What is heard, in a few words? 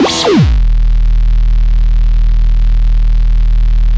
zubbedup distorto bass; Basses